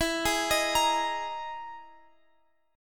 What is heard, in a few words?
E Major 7th Flat 5th Guitar Open F; x,x,16,15,18,17; arpeggiated